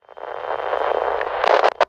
pda communication lost; STALKER; IWP

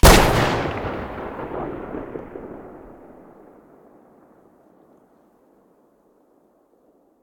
gauss shoot stereo, weapons

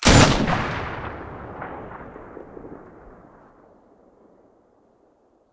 muzzle pkm mono, weapons

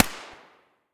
silencer p220 mono distant, weapons

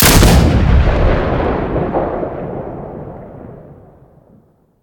rpg7 shoot, weapons

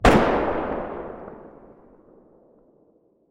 muzzle mono far, weapons

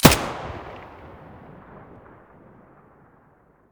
mp5 muzzle stereo, weapons

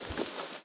rpg7 holster

